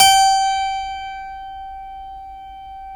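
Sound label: G4 clavinet left, Keyboards Acoustic; USB Soundscan vol.17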